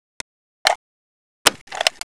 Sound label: sniper reload; DarkCity; weapons